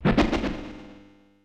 Jump Flip Attack; C Falcon; Super Smash Bros

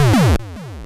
Bobomb; New Super Mario Bros. Wii

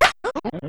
bug die; Gnat Attack; Super Mario Paint

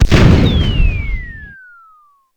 Cannon Blast Off; New Super Mario Bros. Wii

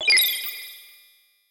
Star Rod Fire; Super Smash Bros

